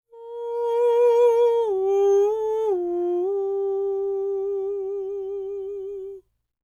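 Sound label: falsetto, Vocal Planet Jazz FX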